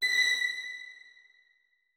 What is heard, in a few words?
notes, keyboard; Hideout Furniture